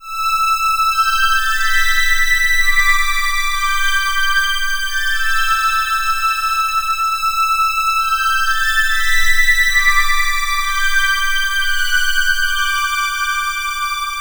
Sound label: Old Cheese Highs, Electro Lounge; Sonic Foundry (Sony Creative Software); 135 Funky Air